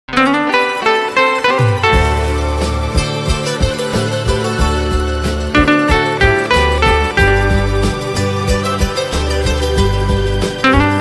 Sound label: Instrumental Acoustic Guitar Cover